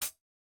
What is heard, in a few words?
hihat, house